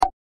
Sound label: message received